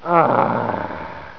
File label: lose game; Hang2000 v1.1